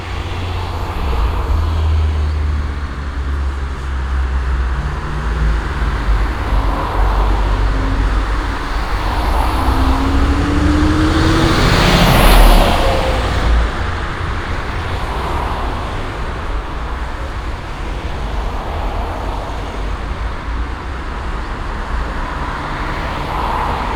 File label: Road noise, Binaural; SymmetricArray